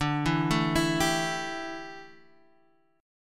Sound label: D Suspended 2nd Suspended 4th D, down, Guitar Half Step Down